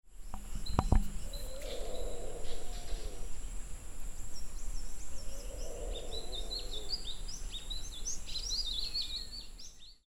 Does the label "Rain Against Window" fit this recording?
No